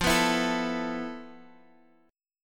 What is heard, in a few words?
F Major 7th Flat 5th, Guitar, Drop D; x,8,9,9,10,x; down; chord shape